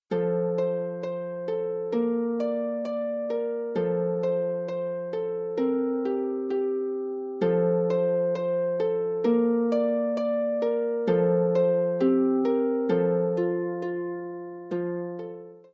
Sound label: Harp; Tudfuls Harp; Pwt ar y Bys; telyn tudful